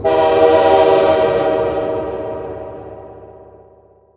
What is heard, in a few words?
train